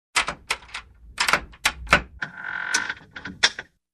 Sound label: Locked Door open with creaky sound